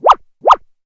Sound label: King Slime Stand